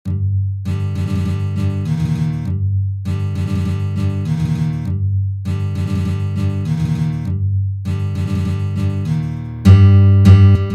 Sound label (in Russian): na gitare